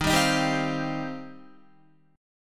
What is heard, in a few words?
Eb 6th Add 9th, Guitar; strummed